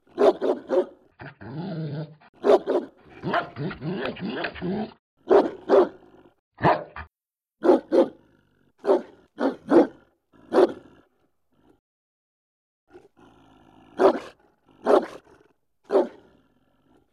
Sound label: german shephard; daniel simon